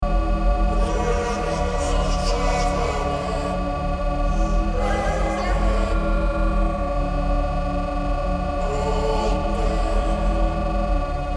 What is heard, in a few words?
Male Choir